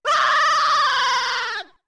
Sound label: Fall; Zora Link's screams while falling